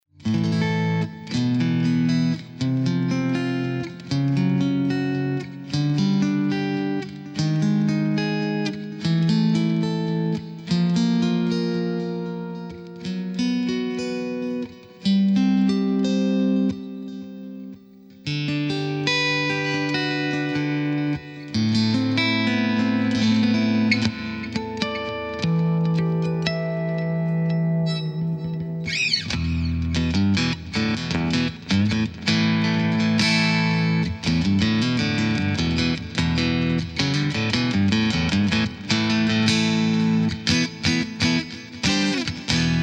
Metal Clean, Cyber Deluxe

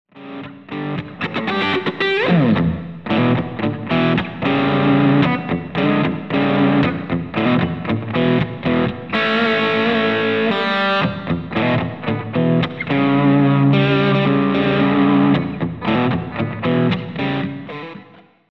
BD2 Half Gain

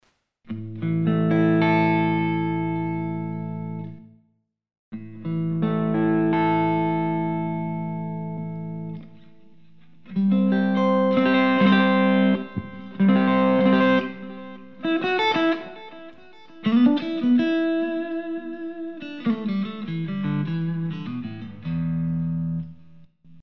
SD1 Clean Boost

